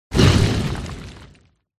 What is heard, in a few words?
golem wound